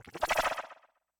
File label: UI Splat; TheLibrarybyEmptySea